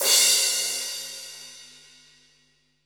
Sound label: Stand Crash, Fusion Kit; Akai MPC500